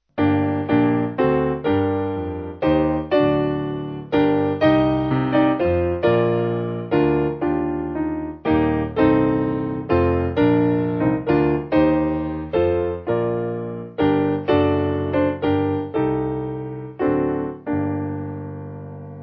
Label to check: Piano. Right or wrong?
Right